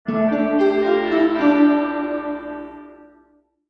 Gliss Arpeggios; iLife Sound Effects

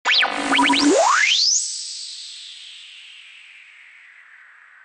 Static Accents, Stingers; iLife Sound Effects

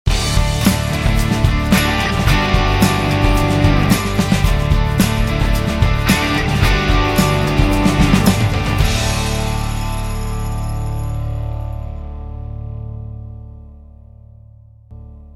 Two Seater Short, Jingles; iLife Sound Effects

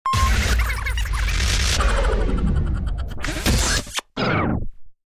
Comedy Production, Stingers; iLife Sound Effects

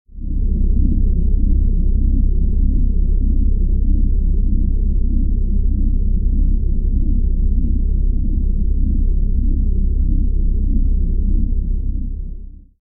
Star Drive Rumble, Sci-Fi; iLife Sound Effects

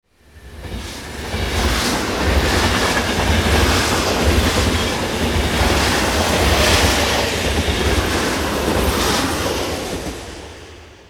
Train Pass; iMovie Sound Effects

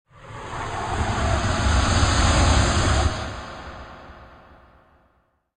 Shuttle Landing, Sci-Fi; iLife Sound Effects

